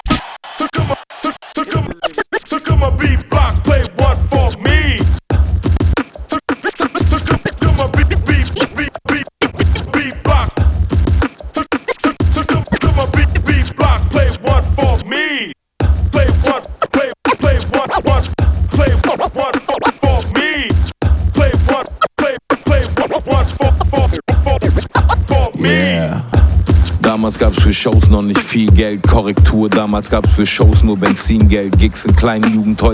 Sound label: beatbox skit